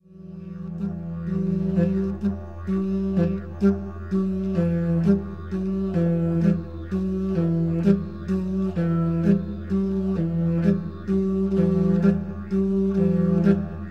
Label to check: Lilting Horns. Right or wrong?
Right